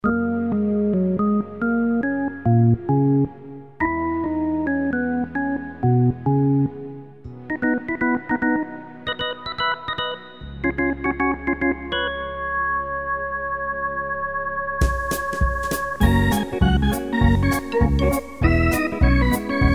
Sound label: Organ-Quickstep version